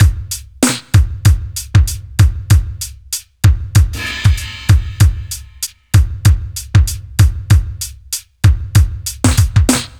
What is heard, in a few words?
96 bpm drum beat g key, Free drum loop - kick tuned to the G note. Loudest frequency: 1280Hz